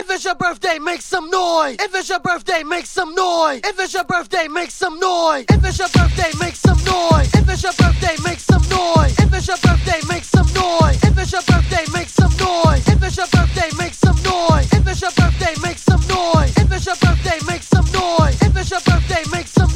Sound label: birthday theme ringtone